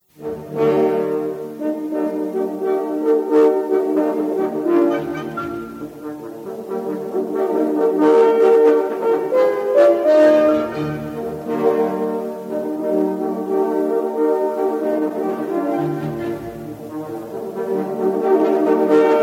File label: french horns excerpt